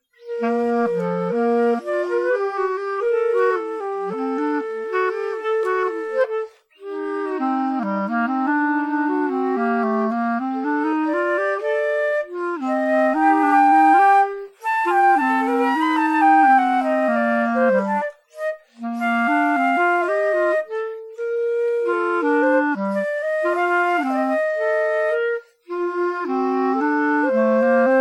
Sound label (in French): duo pour flute clarinette; blanchet remi